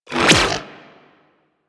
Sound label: Split Laser Retract, Weapons; Warframe; Corpus